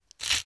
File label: Salt mill short